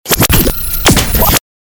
Galery Noise; Sound Ideas - Galery Noise 001

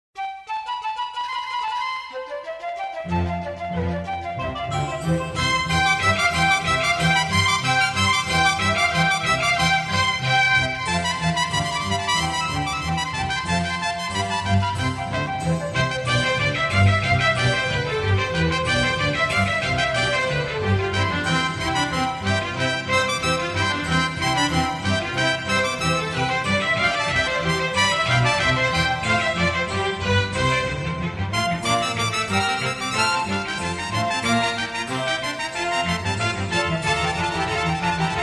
Easy Listening & Classical; Sound Ideas

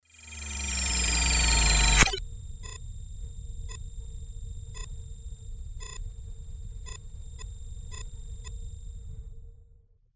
Galery Noise; Sound Ideas - Galery Noise 001

